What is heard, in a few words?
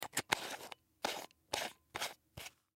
Flashlight Screw Cap On Plastic; Electronics; Foley; Blastwave